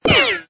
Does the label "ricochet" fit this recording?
Yes